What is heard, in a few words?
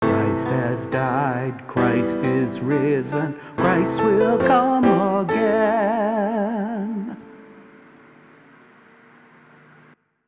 Mystery of Faith vocal